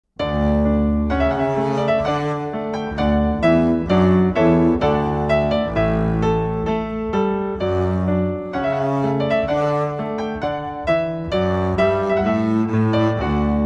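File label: trumpet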